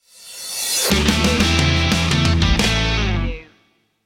isolation blues stinger

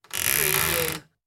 creaky wooden closet door

